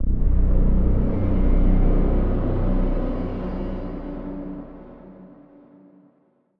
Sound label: Mission fail; Eon Altar